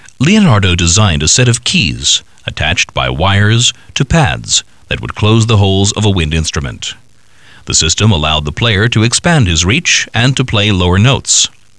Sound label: FLUTE